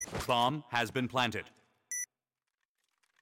defuse

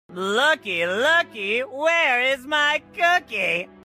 rhahahahah

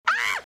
aaahhh

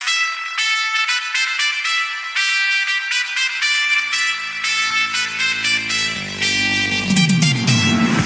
fanfare

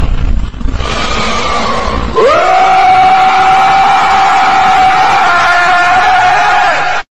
rahhhhhh

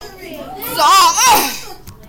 daaaaaa ehhhhhh

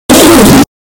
jumpscare; interminable rooms; a-100

